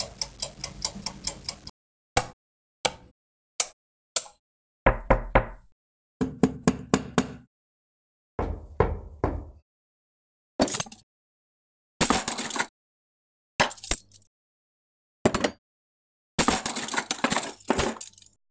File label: Essential Foley; MG Pro Essential Foley Vol 1